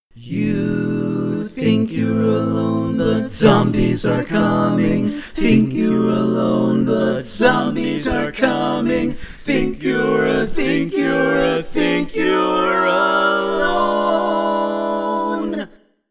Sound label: All Parts, barbershop